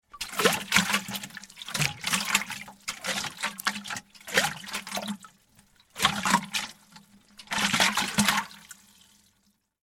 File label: Jug Shake Long, Water, Open, Lid, Slosh; Foley; Shaking; BlastWave